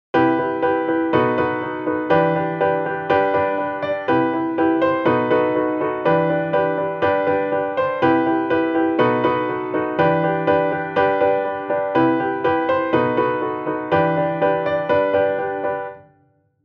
Session Player Block Chords; Block Chordsのフレーズ; シンプルなコード演奏; Logic Pro 11; Keyboard Player; 一定間隔で演奏されるコード伴奏

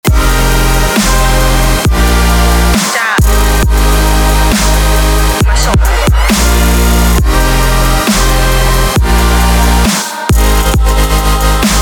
Future Bass

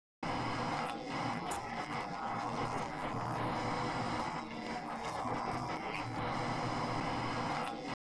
noise

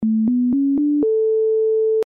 sine

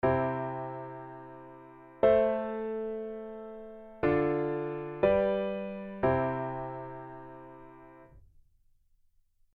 chord progression

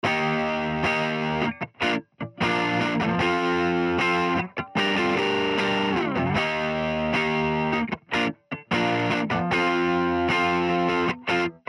guitar before

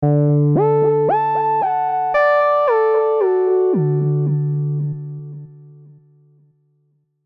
glide

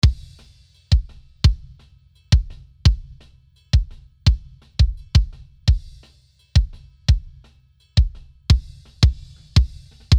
kick normal comp

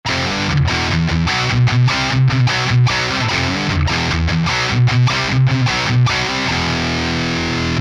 heavy

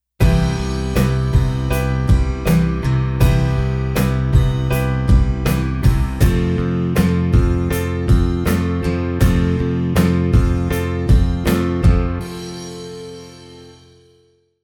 cmaj7 f

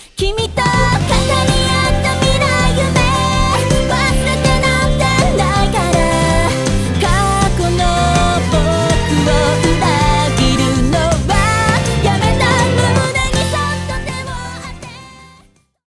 Ozone 10 Impact

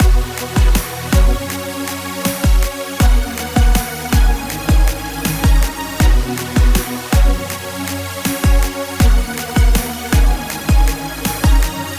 Backing

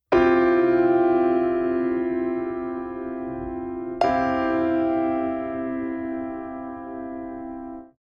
Cadd11 piano

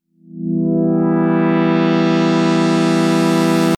env plus

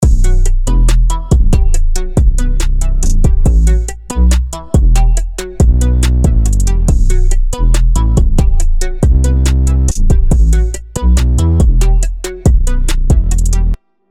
Low Lifter Off

